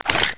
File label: rpg get rocket, weapons